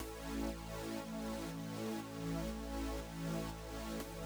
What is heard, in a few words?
B Chords 120 A Tail